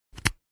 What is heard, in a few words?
technology usb a cable plug in; zapsplat